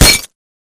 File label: bullet light ballistic solid glass close